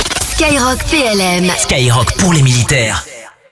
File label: JINGLE; SKYROCK PLM